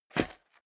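step dress boots concrete grit single stair up, footsteps; schritte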